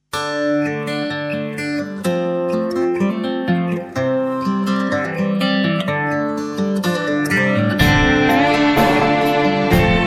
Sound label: Lullaby